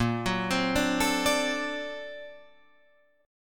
A sharp Augmented Major 9th Guitar Open D

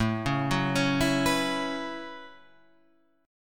A 6th Add 9th A down Guitar Standard; A6add9; arpeggiated; JGuitar

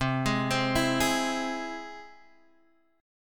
C Augmented, chord; arpeggiated; 8,11,10,9,9,x

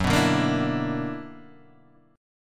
B-Minor Major 11th

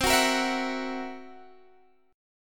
C 7th Flat 5th C down Guitar Standard; C7b5 strummed; JGuitar

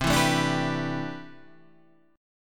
A Minor 11th C, Chord

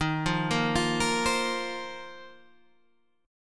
Bb Suspended 2nd Suspended 4th Eb

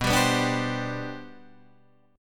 G Major Add 11th, B, Gadd11/B chord; strummed; JGuitar

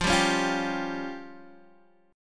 F Suspended 2nd Sharp 5th F down Guitar Open D

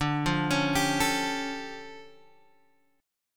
D Minor Major 11th, down, Guitar, Open G

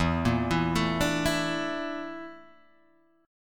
A sharp Major 7th Flat 5th E

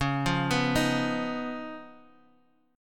Db 9th Db down Guitar Half Step Down

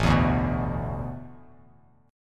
A Suspended 2nd Suspended 4th, Bass Standard

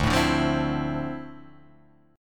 D Minor 9th, down

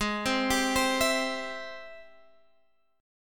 C Major, G sharp, guitar chord; arpeggiated; JGuitar

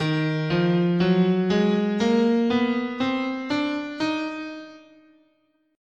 D sharp Bebop Melodic Minor, scale

